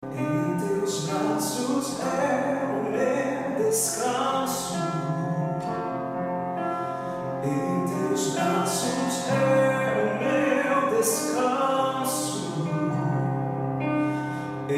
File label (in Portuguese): tenor